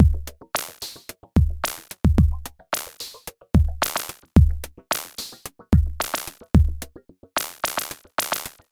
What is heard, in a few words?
beat